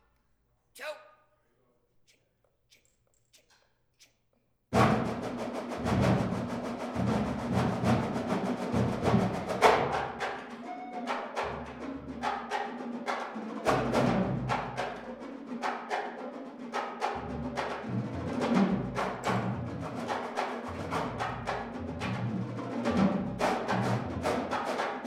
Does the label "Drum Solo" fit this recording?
Yes